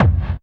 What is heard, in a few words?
KICK hardcore, Techno Trance DRUMS